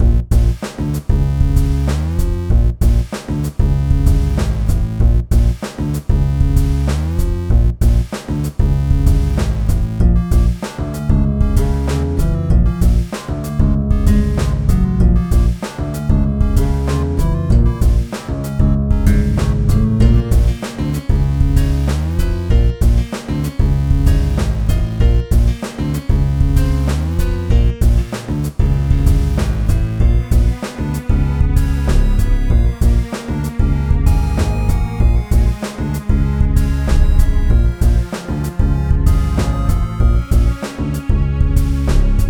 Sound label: More Bass